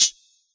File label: HH Metal Closed, Yamaha DJX; DJX Kit